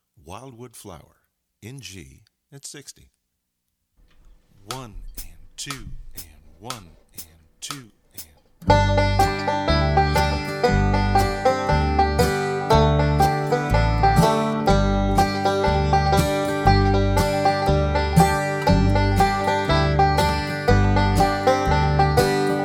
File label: Wildwood Flower @ 60 bpm